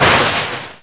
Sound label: Cloud Lightning; Lightning from a cloud; zelda